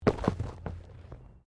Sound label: Body movement Impact Roll Gravel